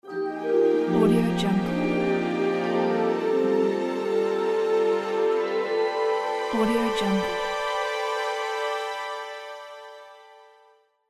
orchestra logo

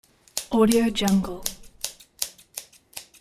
scissors

